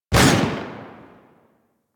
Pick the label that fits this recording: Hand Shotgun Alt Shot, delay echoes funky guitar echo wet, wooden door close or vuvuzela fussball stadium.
Hand Shotgun Alt Shot